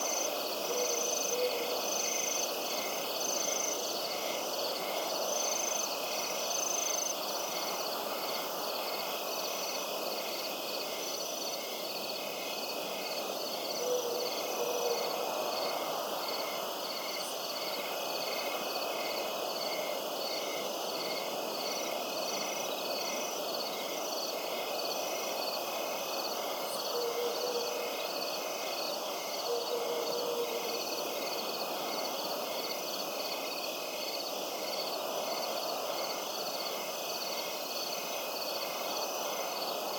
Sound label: Ambience Night Loop